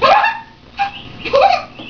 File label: giggle